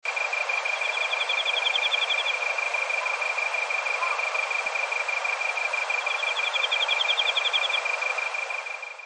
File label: bug